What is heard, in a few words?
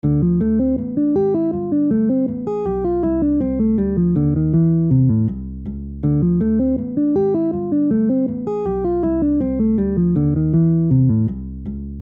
major 251